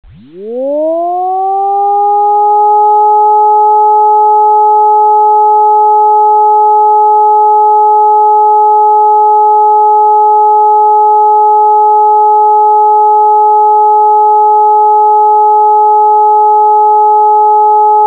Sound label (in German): Entwarnung; stadt muenster; feuerwehr